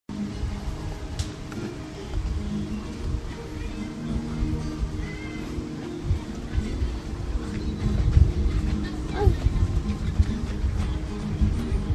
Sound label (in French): la guitare